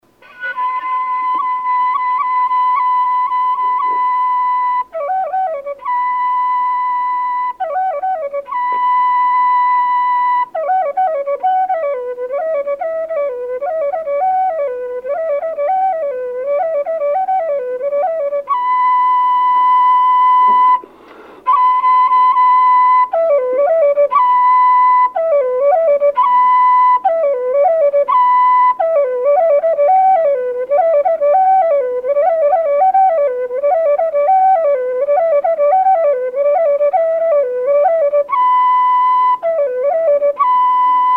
umwironge, burundi

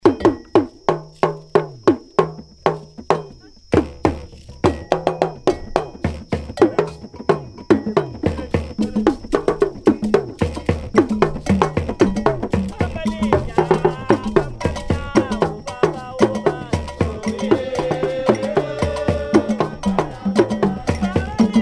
dundun, ghana, efutu

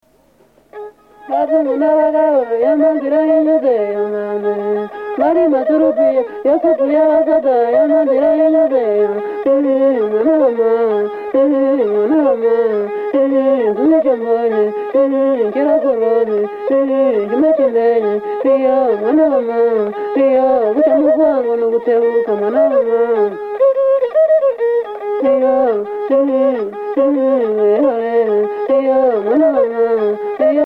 praise song, Burundi; Muramvya

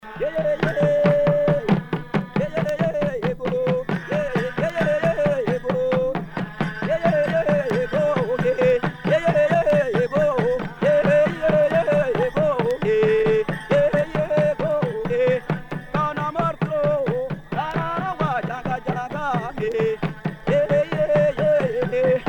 Amajugo, uganda

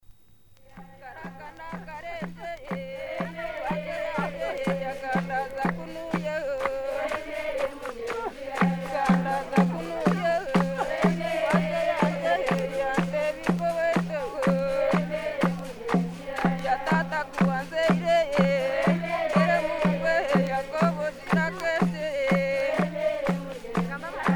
Ekizino Kunta, uganda

